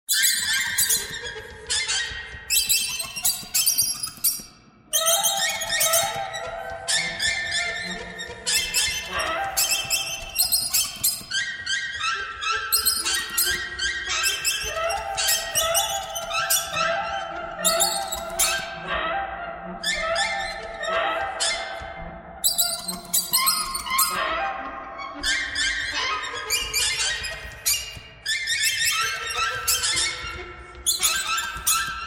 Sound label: birds thread noise all